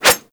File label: Grineer Manic Claw Swipe